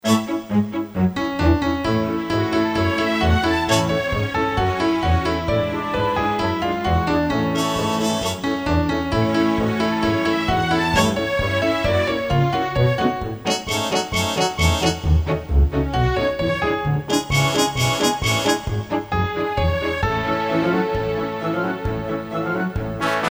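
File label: accompaniment with lead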